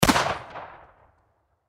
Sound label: Gun Shotgun, Shotgun 12 Gauge Remington 870 Pump Action Single Shot; Distant Perspective; Warfare